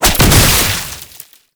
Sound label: Tripwire Explosion; Enemies; Gameplay; Boss; Warframe; Oraxia